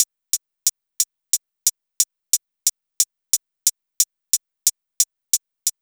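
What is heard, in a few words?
MPC hi hat, drum kit; USB Soundscan vol.03 - Pure Electro; AKAI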